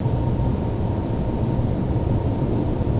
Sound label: winds; hl1mp; elite ltd